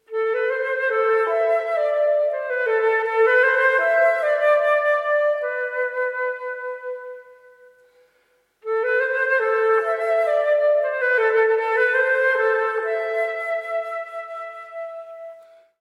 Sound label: Tune; Kameo